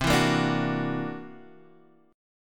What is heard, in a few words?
C 9th Sharp 11th C